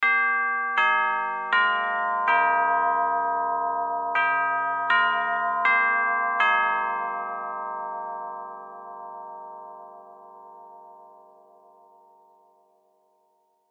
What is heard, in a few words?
Time Melody Clock, Time Melody Clock Chimes; Musical; Blastwave